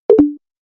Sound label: call end